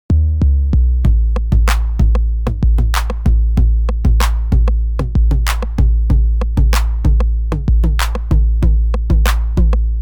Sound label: boom, 909